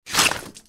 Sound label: gecko claw atk; npc